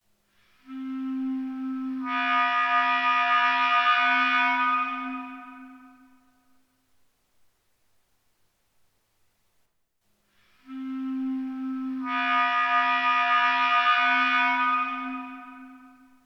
clarinete ruído

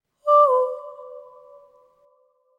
voz terca maor rápida

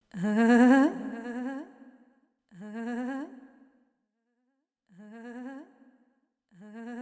risada

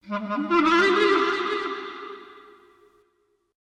clarinete complexo

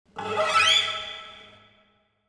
violão raspando

